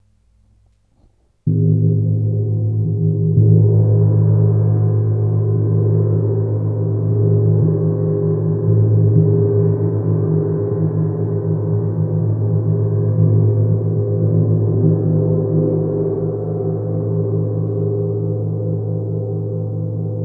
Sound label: wind